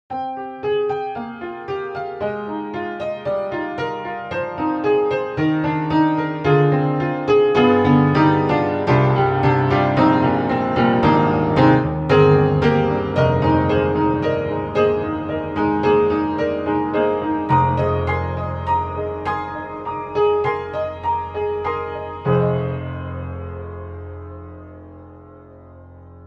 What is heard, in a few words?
Vintage Upright Vintage Dream; Synthogy; Upright Pianos; Ivory II